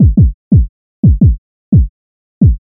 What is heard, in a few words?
808 E, kick loops, phonk; drum loops; phonk type kick loop; abletunes